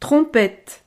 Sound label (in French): trompette